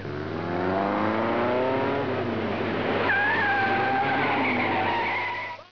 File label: squealing around corner